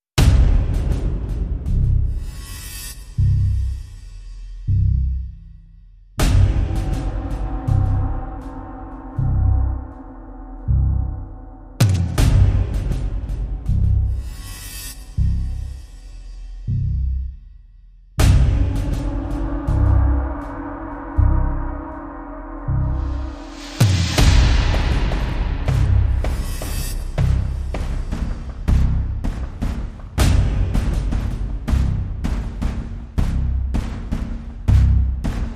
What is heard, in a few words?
Rhythm